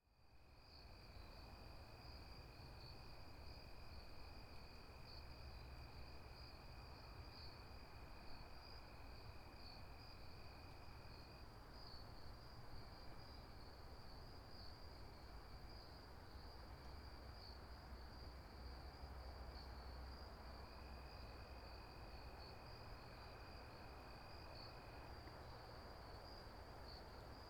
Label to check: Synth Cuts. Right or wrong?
Wrong